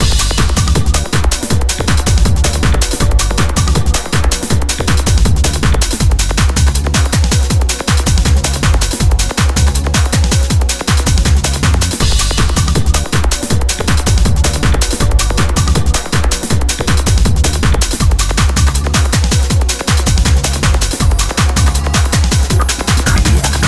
common menu music (loop); itg

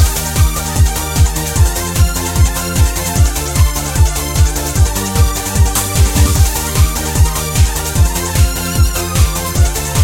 music loop

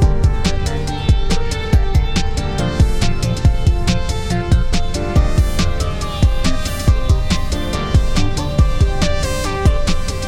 Night Rains loop

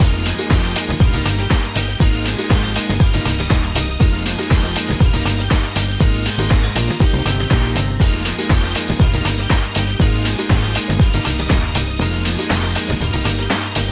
training music loop

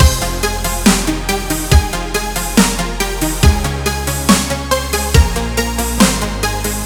Confused (loop)

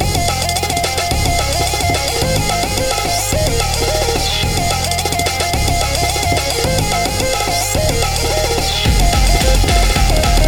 loop

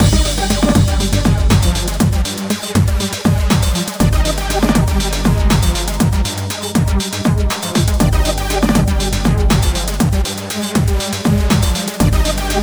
music loop

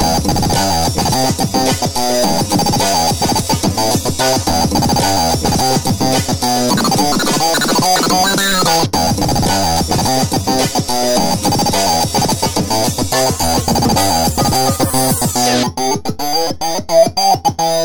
loop

